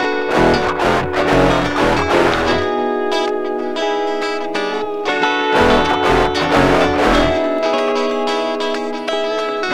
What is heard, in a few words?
ride